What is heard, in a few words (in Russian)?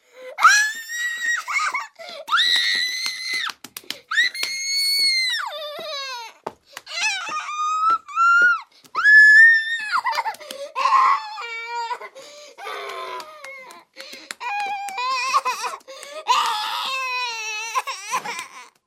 vizzhit i kolotit ruchkami